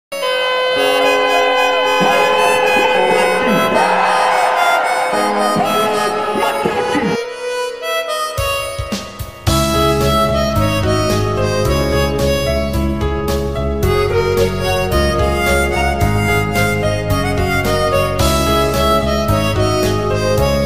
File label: instrumental ringtone indian parade